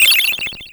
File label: sfx menu select